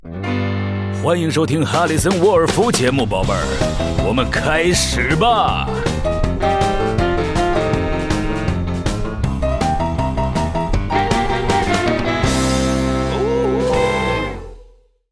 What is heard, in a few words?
Mandarin Sting; 哈里森（双点校园）; 开场白